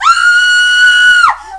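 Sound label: Girls scream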